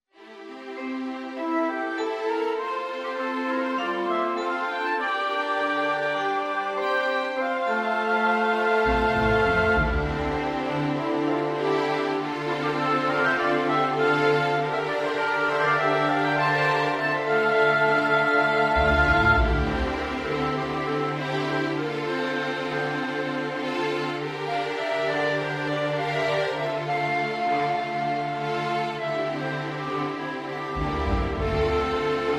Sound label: The Desert Sun, Midi Play Orchestra, small orchestra